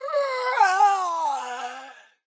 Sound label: Ratcaller Death